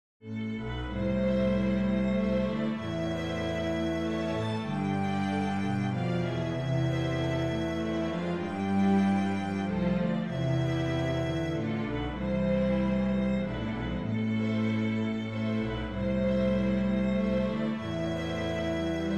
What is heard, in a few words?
barcarolla, string bass